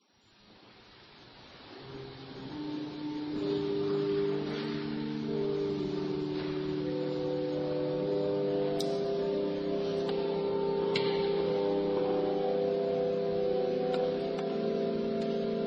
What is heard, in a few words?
organ improv; Winter Colloquium